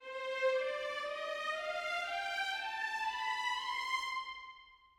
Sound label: c min scale